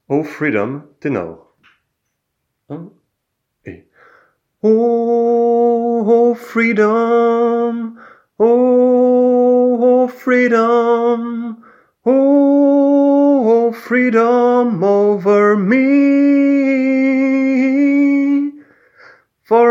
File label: O freedom Tenor